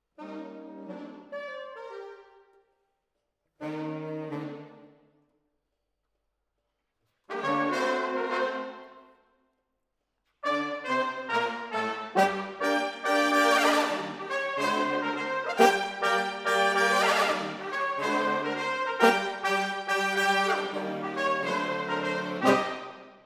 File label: Horns Over